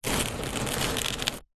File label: foley cardboard box small move slide slightly gritty ground; zapsplat